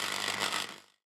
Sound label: robot repair; Factorio